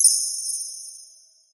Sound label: amb random tech; source engine; portal2